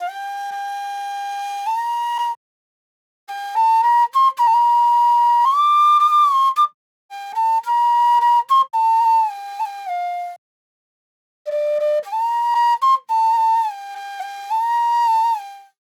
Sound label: 90s Flute Loop 110 BPM F